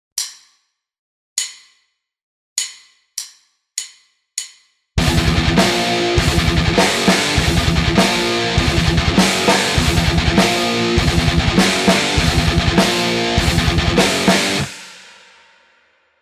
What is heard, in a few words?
Riff, guitar; Pickipedia: learn to play 23 rhythm guitar riffs